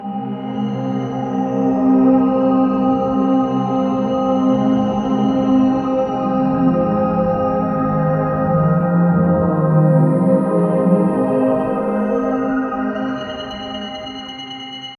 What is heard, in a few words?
calm pad for atmospheric, 90s